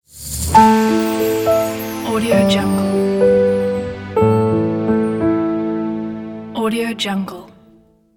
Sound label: piano logo; nick-mayburov